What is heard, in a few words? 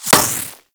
Laser Impact Metal; Warframe; Weapons; Bullet Impacts